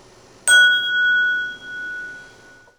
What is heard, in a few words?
ting